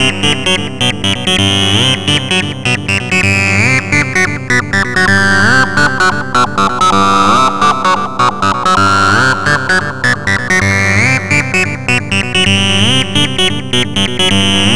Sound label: NRG Lead, trance